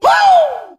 woo

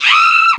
scream

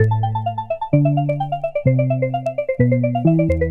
etude in sine; Loops; Electronic

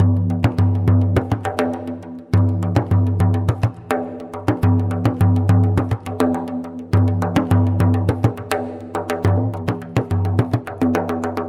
arab, Drum loops

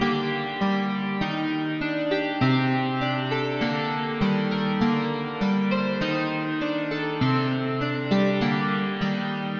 piano, sad loop

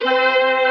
synthetic, String; Notes

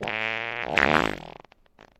fart long two bursts; Farts

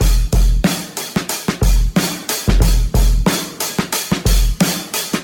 Boombap drum loop

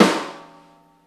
grue, Snares Knocks

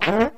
fart short

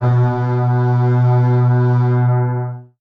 lofi, synths; Notes

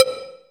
mug, Misc Percussion Ticks

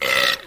burp short

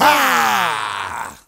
ha, Voice ooh ahh

